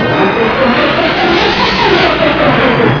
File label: falcon failure; Star Wars